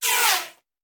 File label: Bullet Pass By; Sound Ideas; Guns